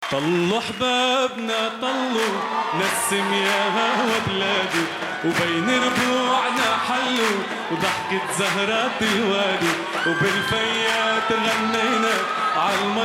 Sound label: Vocal